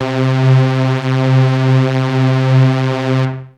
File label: 303-Style single hits; FM 57 - The Ultimate Sample Collection (1997)